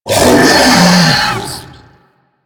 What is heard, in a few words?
Infested Death Vocal, Enemies; Warframe; Femur